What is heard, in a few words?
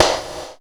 Gated Kit, Percussion